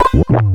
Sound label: Yoshi Swallow Pokey; Wii